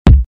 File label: KICK; ATTACK KICK 1; attackpack